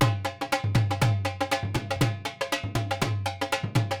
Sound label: Dhol